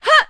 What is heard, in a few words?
Attack vox